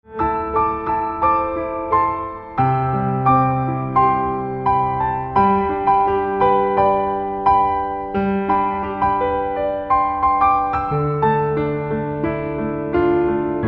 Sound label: Piano